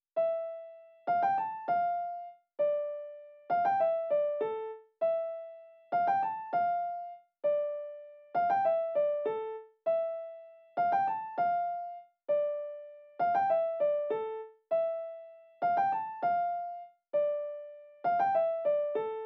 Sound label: West Coast Melody Loop - 99 BPM A Min Piano; Melodies; Loop Stems; Cymatics; Oracle Sample Pack